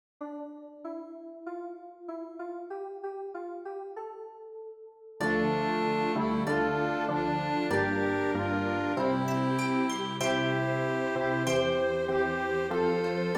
ORCHESTRE; ANAMNESE St Jean

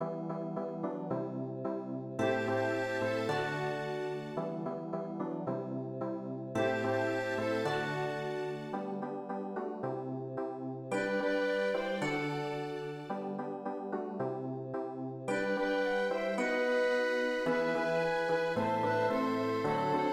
ORCHESTRE

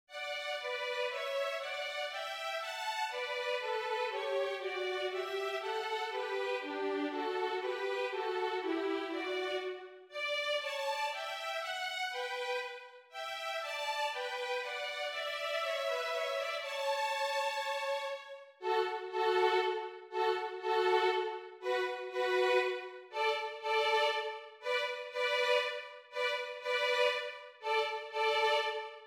violons

